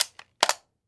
Weapon Gun Machine Gun 9mm Clip Release; AdobeCC

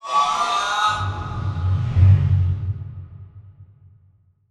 Title Transition Evolver Transformation; Production Element

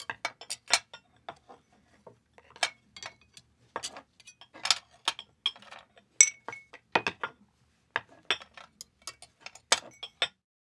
Silverware Movement, Household

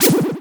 Multimedia Internet CD-Rom Flash Wipe Cartoon

